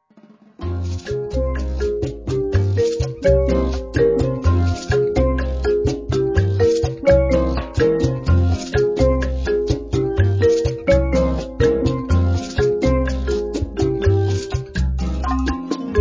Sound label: latin marimba